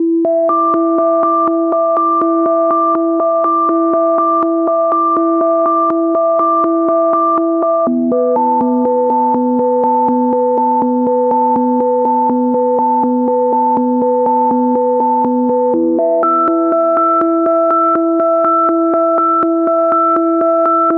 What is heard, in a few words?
122 BPM Melodic Arps A maj G min Tonic D; MMB